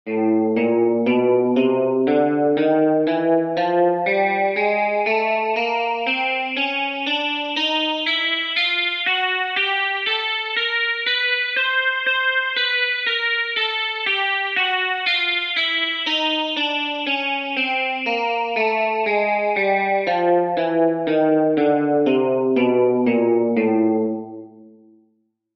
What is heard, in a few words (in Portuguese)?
Exercícios Guitarra 60 bpm